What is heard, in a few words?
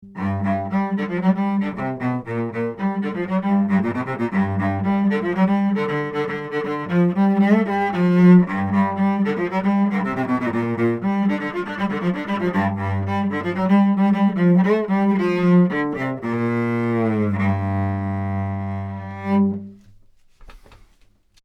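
tf47 Cello cardioid; telefunken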